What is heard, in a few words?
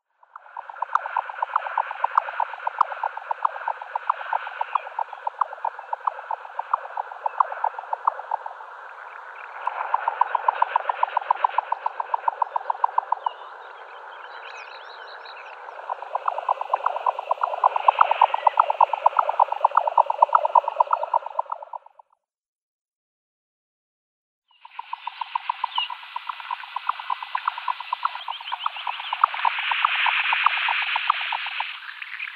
Jack Snipe male display flight